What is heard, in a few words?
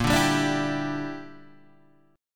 Bb 6th Flat 5th, Guitar, Open G; 8,7,5,7,5,5